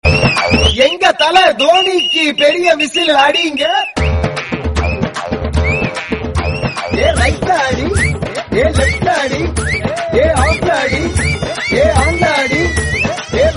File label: whistle; chennai super kings